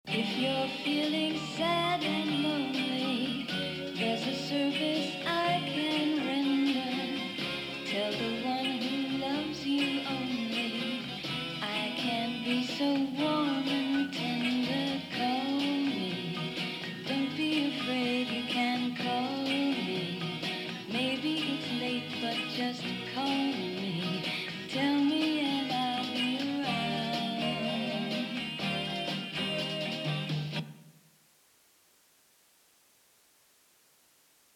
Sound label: romantic